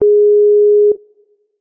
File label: CALL OUT; skype